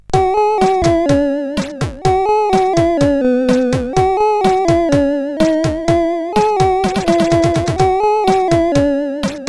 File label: Music Imitation sid CSG8580R5